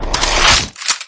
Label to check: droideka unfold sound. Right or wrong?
Right